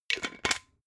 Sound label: glass bottle massive close fast